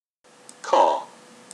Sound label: car